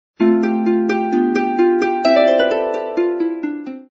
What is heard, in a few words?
Spring melody harp